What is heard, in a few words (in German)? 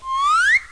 Schlüssel